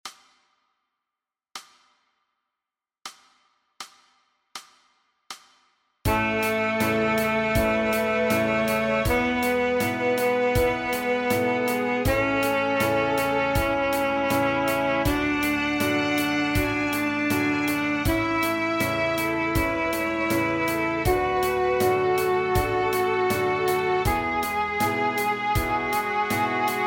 F sharp Scale

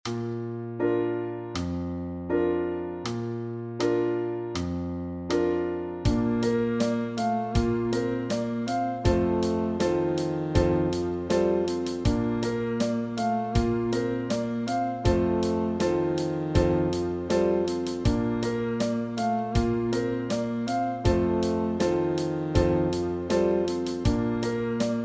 Sax Study

